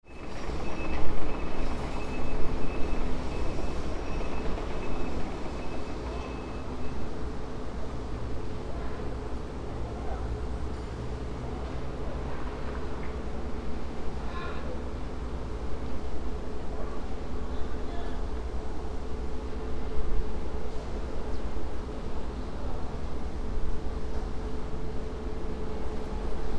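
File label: shinobu temporary site; shinobuyama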